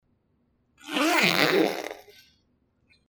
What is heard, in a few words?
zipper